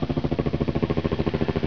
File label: Copter, transports